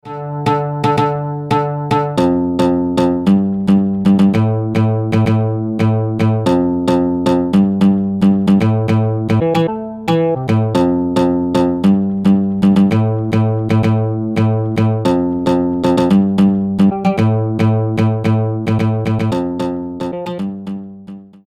Oud; かけ算した結果のウードの演奏

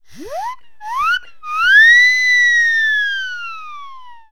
siren

